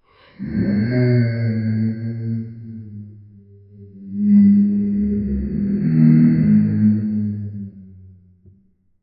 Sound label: beast roaring befor battl